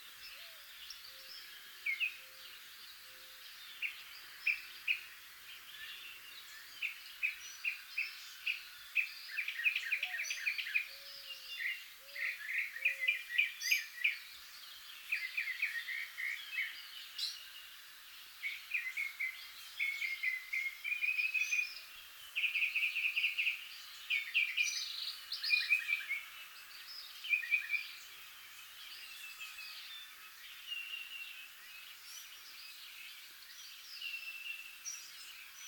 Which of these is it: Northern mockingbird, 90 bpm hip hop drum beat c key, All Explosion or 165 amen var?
Northern mockingbird